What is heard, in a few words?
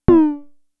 Tom, Toms; Fricke Schlagzwerg; Drum Machines